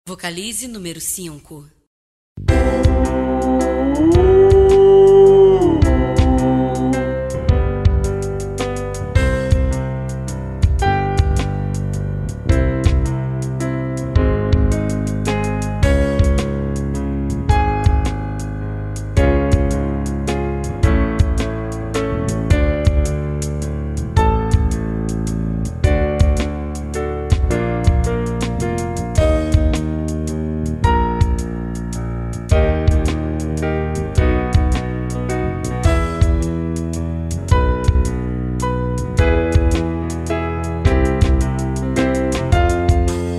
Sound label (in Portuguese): sem voz, vocalizes canto; exercicios canto